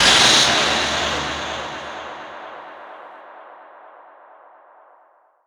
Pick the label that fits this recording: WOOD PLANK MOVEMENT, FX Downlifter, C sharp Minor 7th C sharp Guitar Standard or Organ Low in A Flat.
FX Downlifter